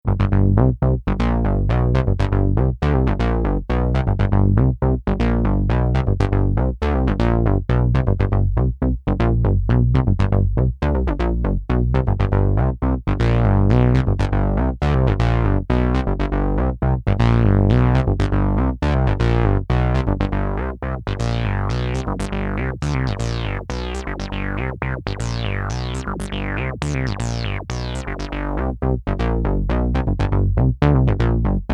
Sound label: sequenced bass